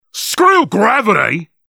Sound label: Jump; "Screw gravity!"; Versus Saxton Hale